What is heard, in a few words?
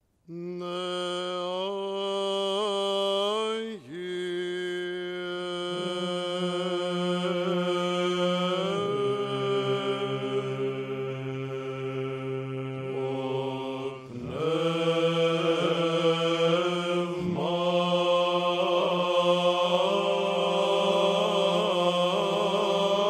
koinonikon dry